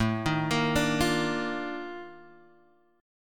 F 13th A, chord

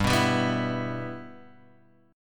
G 6th, Guitar, Standard; G6 strummed

